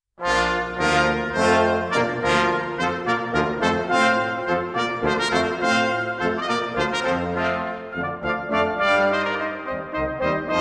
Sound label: hornpipe water music, brass band